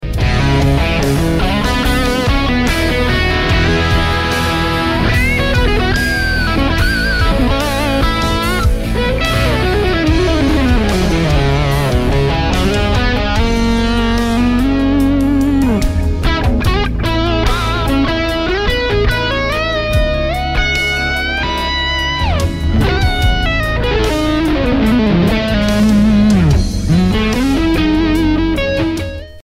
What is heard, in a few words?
Riff; Classic 800 Fuhrmann